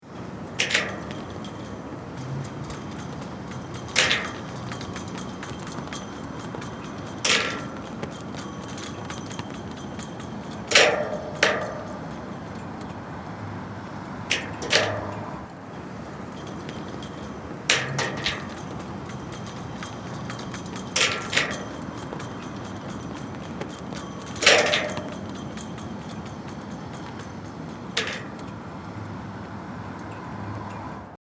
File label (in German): Landschaft Fahnenmast und Kette